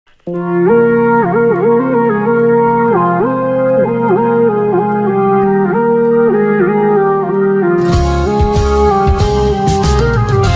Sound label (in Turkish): fon muzigi; konanlar muzik